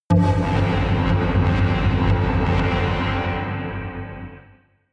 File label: synth hit tube; whooshes rises